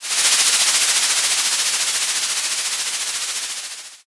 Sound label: frying